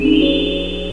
REWARD

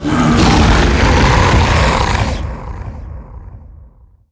typhon hit; DAoC

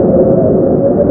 TRAM; PC World Komputer 1998 November; Nfs3

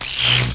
ELECZAP1; SHADMAST

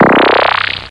rippler up, electronic sounds; Sound Effects for Multimedia - Volume 1

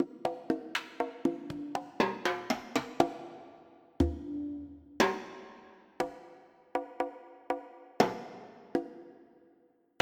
Conga & Timbale

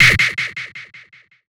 FX shot, Drum+Bass, 160; eJay

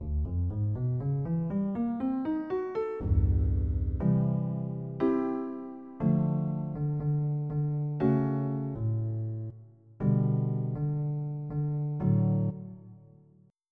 Acoustic Grand Piano; FF7

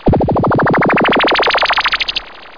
laser gun

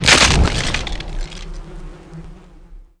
sack

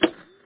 TV toggle; Anchorman Press Kit

